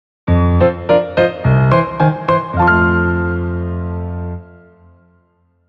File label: Skill Learning Jingle; Umbran Dance Beginner Steps; Cereza Skill Learning Jingle 1